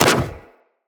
Fire Arrow Impact

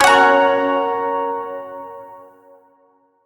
Shawzin Yo Chord I; Warframe

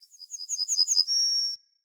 Bird Song; Galene Bird Guide

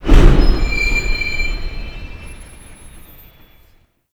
Large Train Car Stop; Warframe

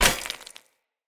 Sphere Polearm Medium Impact Rock; Player; Environment

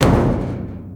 Metal Barrel Impact; Warframe; Ambience; Nineteen Ninety Nine

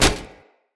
Unarmed Punch Impact Flesh Armour Heavy; Weapon Impacts; Player; Combat

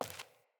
Sandals Walk Default; Player; Footsteps

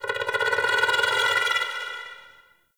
Sculpture Movement; Warframe; The War Within; Mountain Pass; Oro

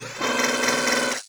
Foundry Motor Backward; Liset Machines; Tenno

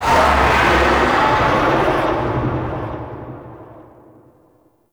Distant Oni Vocal; Warframe; Cetus; Cetus Invasion; Ambience

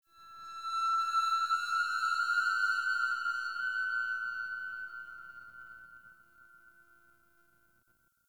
ambient sentient moan; Warframe; Tau

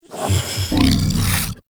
Vocal Breathe Fast

